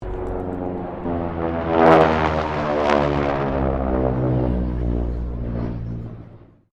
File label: AIRCRAFT AT-6D HARVARD MK III PASS; Harvard Training Aricraft; Airplanes; Vehicles